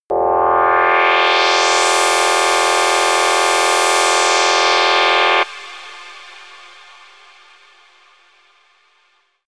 rocket turbine fire power, raumschiff

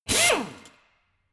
impactor medium, bohrer; maschinen

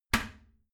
toilet seat lid close heavy, badezimmer

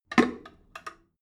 drain valve open heavy, Badezimmer; haus garten

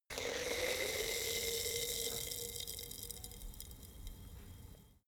bottle vodka fizz, gastronomie

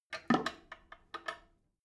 drain valve open soft clatter, Badezimmer; haus garten

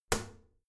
toilet lid wall open regular, badezimmer

